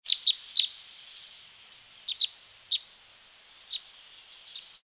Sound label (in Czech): křivka obecná Loxia curvirostra